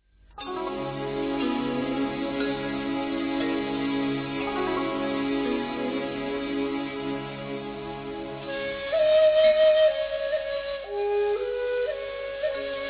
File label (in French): music chinoise